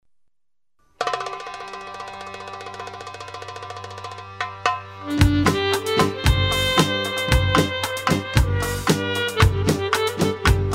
instrumental

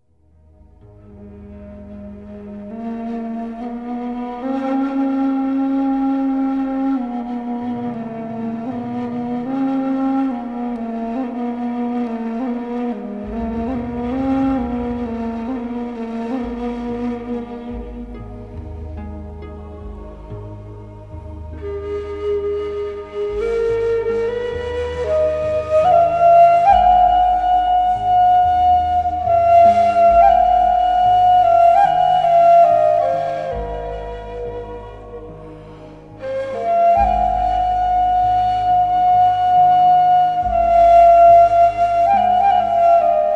Pharaonic Nay

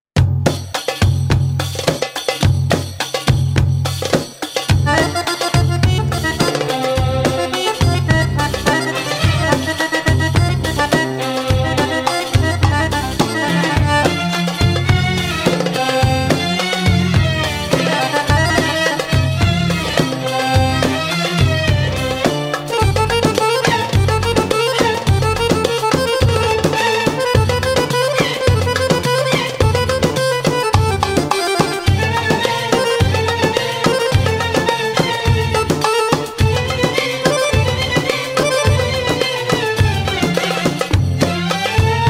Raks Shamadan

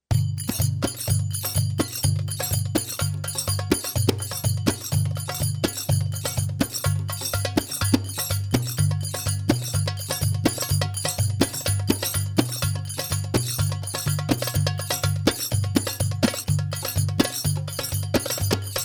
Drums for Training

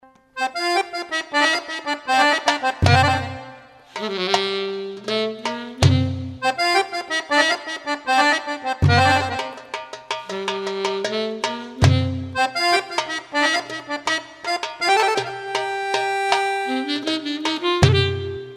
Baladi Accordeon Saidi

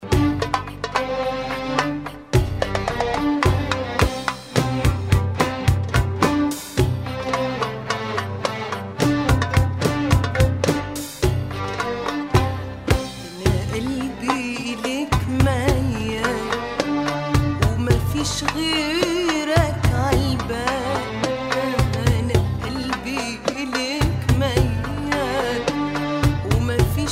Bass vocal

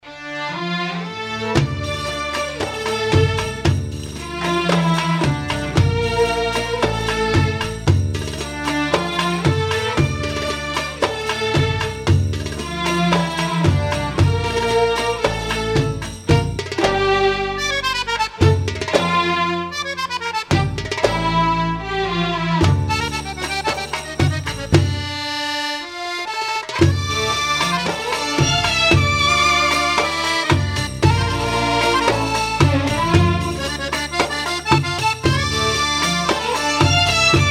Rhumba

